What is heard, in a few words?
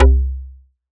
TUN C2, Yamaha TX7 tuned perc